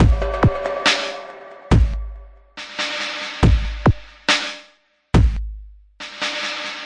070 dubstep break stance, drum loops and breaks

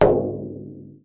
arabic perc, Arabic Percussion Kit

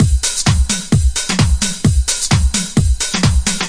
straight up bright house, drum loops and breaks

